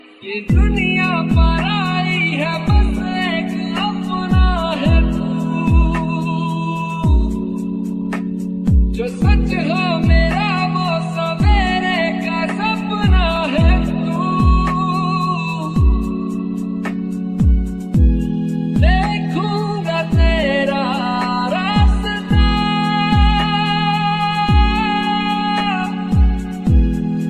Lofi, Hindi

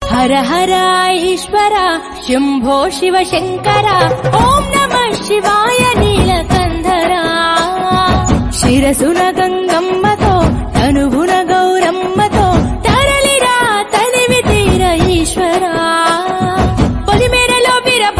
Telugu Ringtone

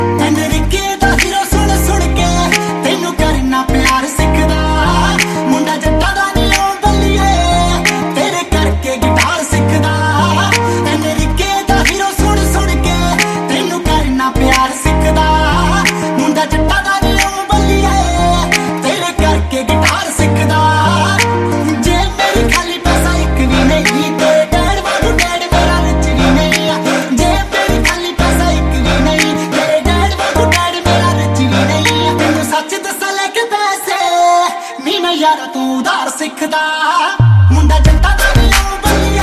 Punjabi Song Ringtone

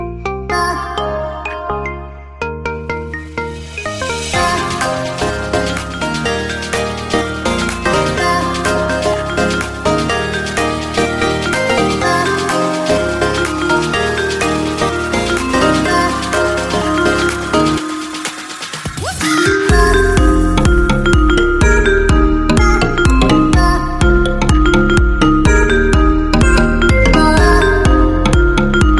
Mobile Ringtone

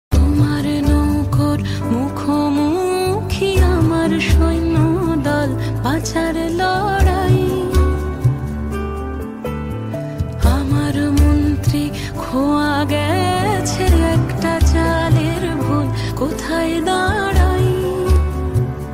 Bengali Ringtone

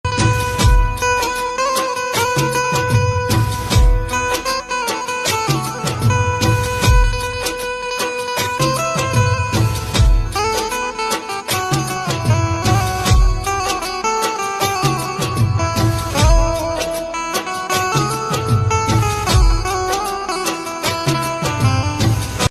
Instrumental Ringtone

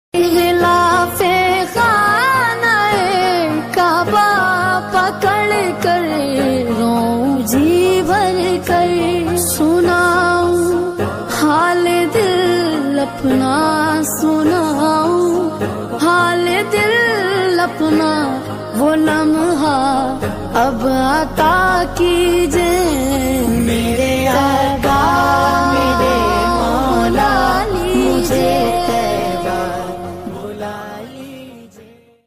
Naat Ringtone

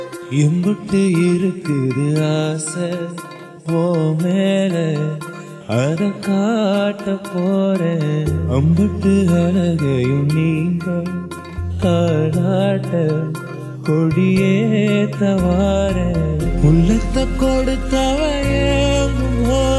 Tamil Ringtone

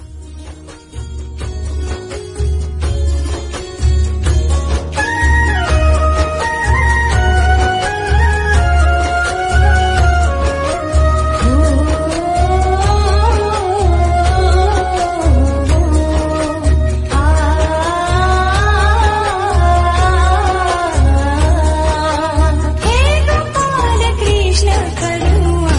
Female Bhakti Song Ringtone

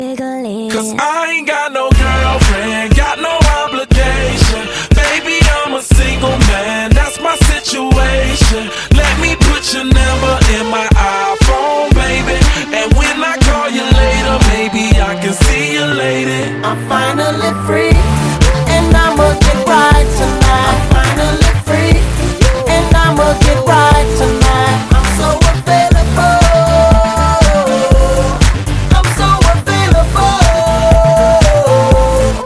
Hollywood Ringtone

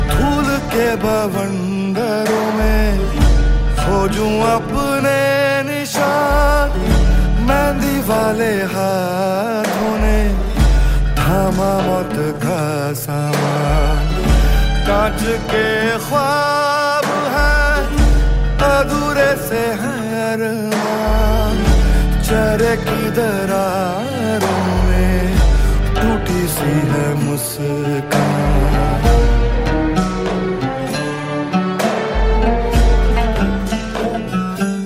Bollywood Song Ringtone

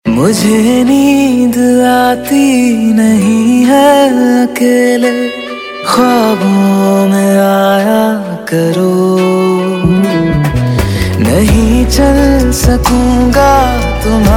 Hindi Ringtone

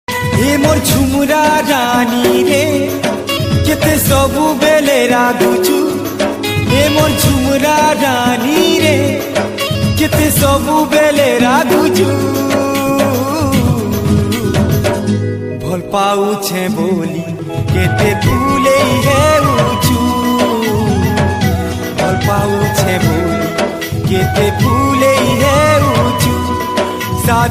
New Odia Romantic Ringtone

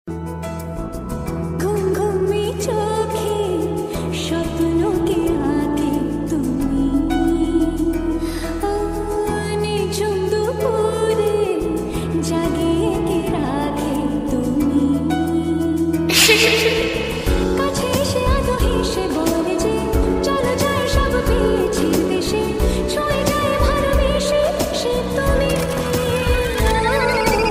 Bengali

